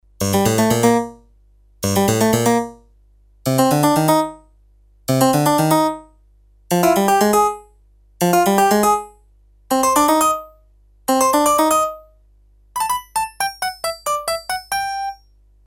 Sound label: HARPSICHORD, Roland MKS-20